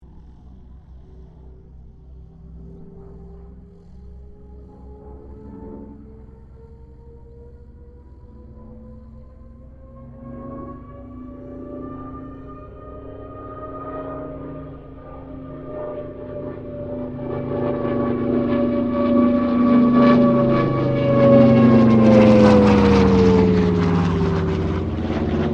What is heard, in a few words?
AIRCRAFT CA-13 BOOMERANG PASS STEREO